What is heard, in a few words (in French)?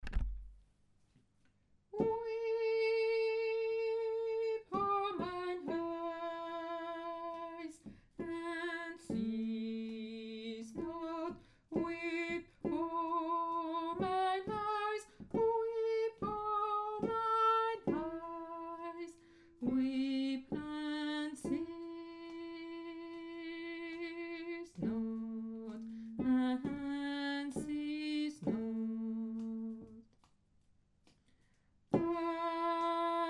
basse